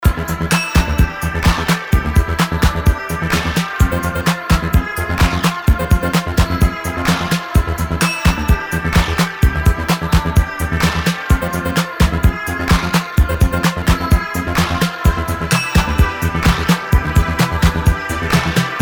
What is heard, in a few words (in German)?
Herzschlag Instrumental